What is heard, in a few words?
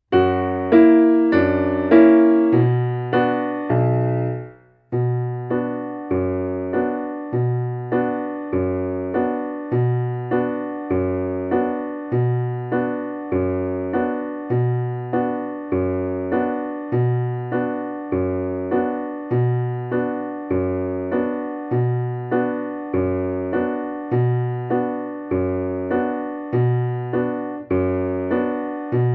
Slavic Song 100